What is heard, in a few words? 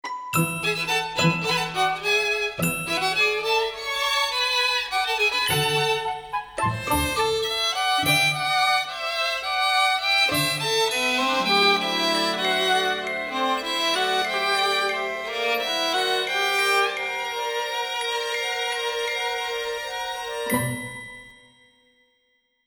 Orchestral Music